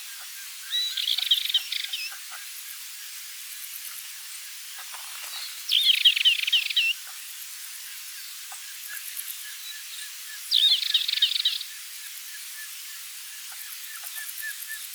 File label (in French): Fauvette pitchou; Stanislas Wroza; xeno-canto